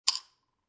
Pill Drop In Bottle Single Small, Foley, Pill Dropping Into Plastic Pill Bottle, Single Small Pill